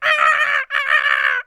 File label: Monkey Falling